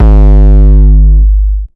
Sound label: Frizzy Hair G, Basses; Total Drum&Bass